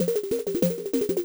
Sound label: Jingle Time Penalty Loop; Super Rub 'a' Dub; PS3